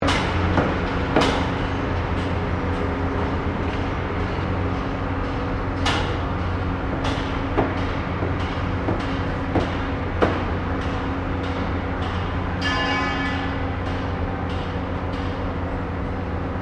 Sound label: Ambience Construction Site Large Loop, Construction, hammering, machinery